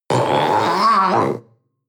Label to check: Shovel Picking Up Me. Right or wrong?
Wrong